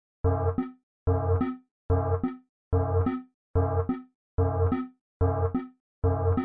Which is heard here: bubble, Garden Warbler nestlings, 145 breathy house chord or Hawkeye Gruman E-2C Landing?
145 breathy house chord